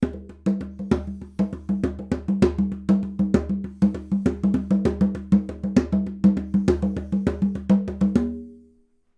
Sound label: Congas Spaced Pair